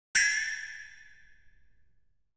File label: Metallic Twang; BBC Sound Effects Library